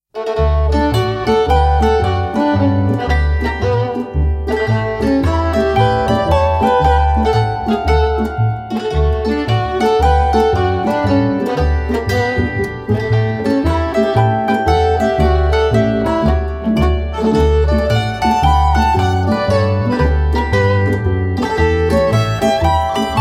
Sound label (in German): Frisch Tirolerisch Hörbeispiel, Tiroler Volksmusik